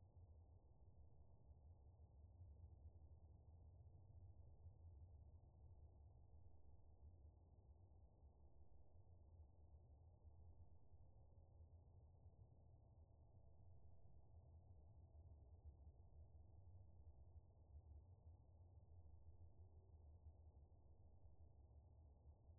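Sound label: hydrophone; ICLISTEN HF6092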